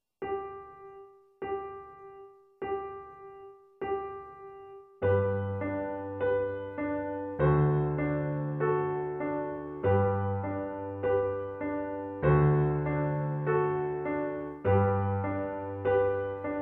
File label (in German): wiegenlied, cello